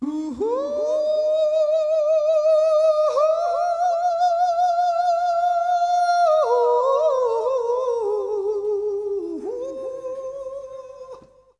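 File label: voice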